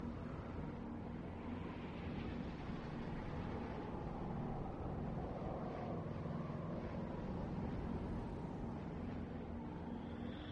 deserted street; star-wars-rogue-one-boots-on-the-ground

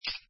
chips; pharaohslotscasino

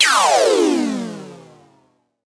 fail result; crossthatroad

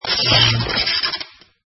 ice spell; inlogic-castledefense

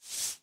slide out; math trivia live

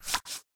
move bad; YummiCookie

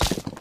object hit concrete; lightning speed

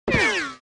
bullet; Alien-Galaxy-War

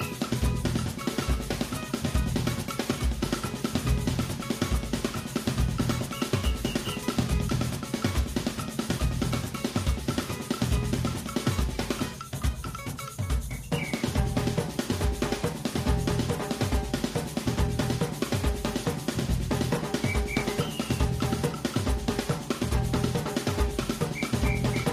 music brazil race loop; lightning speed

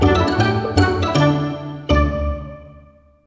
jingle win short; jewelpetsmatch

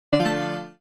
character lvl; sweetcandymania

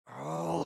bean grunt; sweetcandymania

